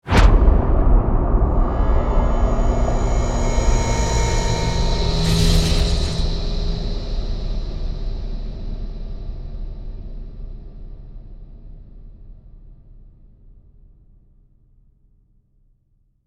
Epic cinematic impact

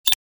Digital button

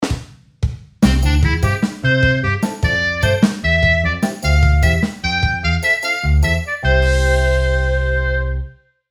Celebrative moment upbeat band sitcom

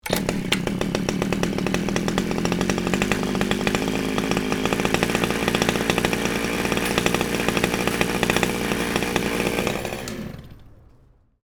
Chainsaw starting running revving and turning off

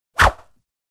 Fast swish whip bamboo stick

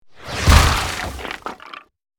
Nature magic spell wood spikes

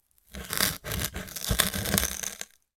Cardboard box cut slice with knife

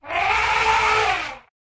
African elephant trumpeting

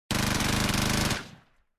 M134 minigun minigun firing long burst

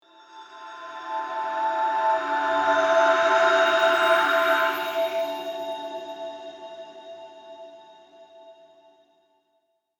Lens flare transition

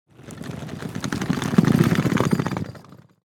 Travel suitcase pass by

